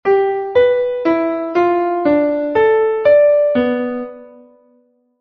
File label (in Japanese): 音高練習